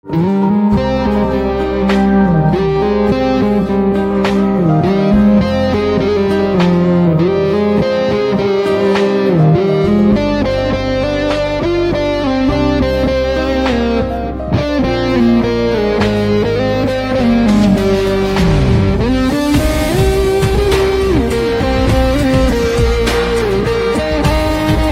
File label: Electric Guitar